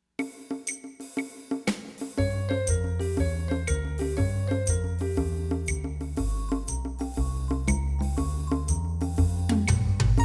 Hot Riffs

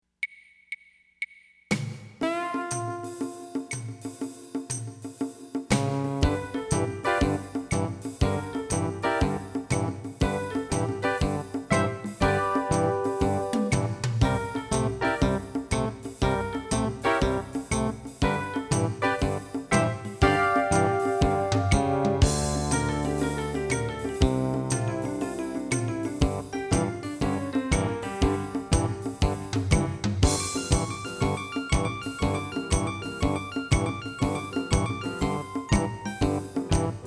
Hot Riff Blues

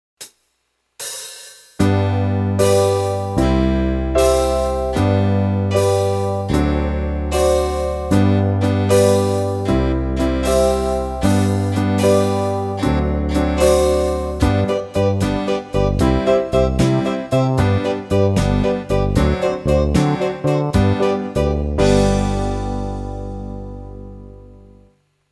Chord Study In Two Slow

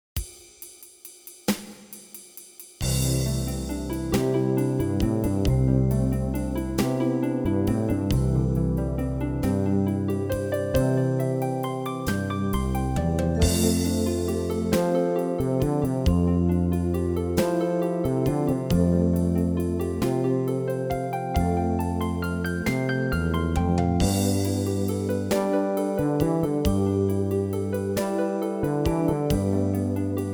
Primary Chord Study

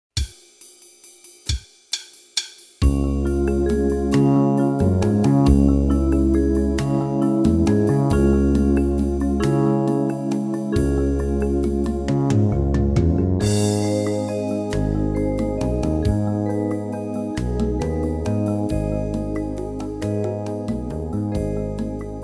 Major And Minor Triads Continued

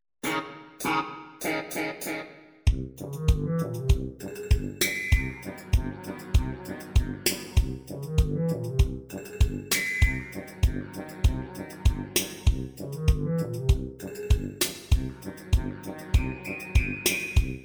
Car Honks

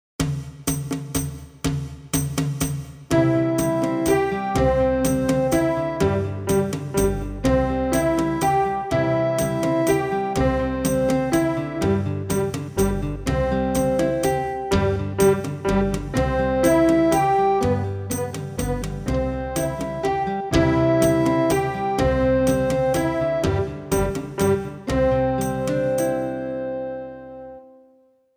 English Folk Song Slow

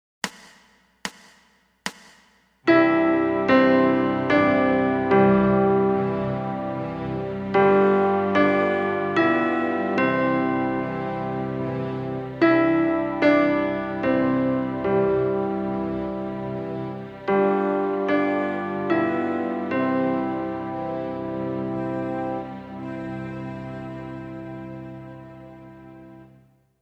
Clock Tower Bells Slow

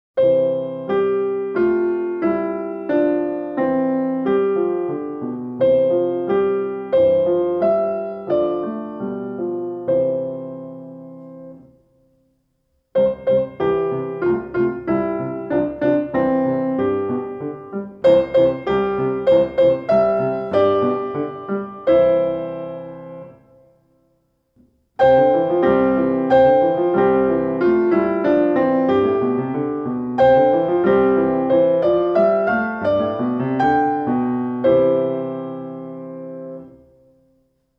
Theme And Variations piano

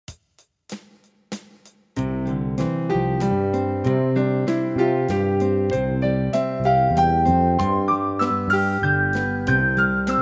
Major Pentatonic Study Slow

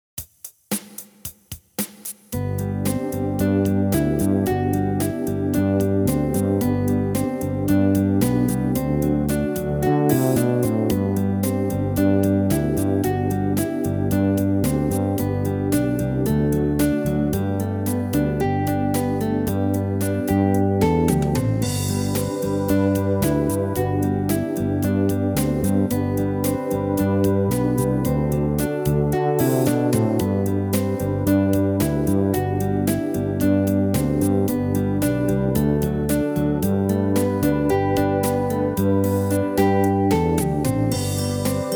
G Pentatscale Warm Ups

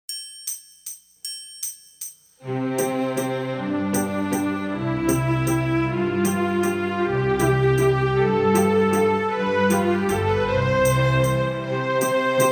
Scale Waltz

